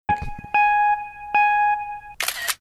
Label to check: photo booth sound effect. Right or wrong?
Right